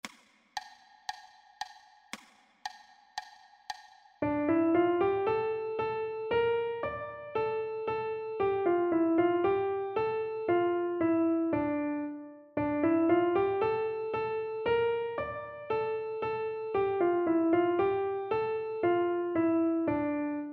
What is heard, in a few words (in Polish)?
orkiestra marsz melodia; orkiestra koncertu niepodleglosci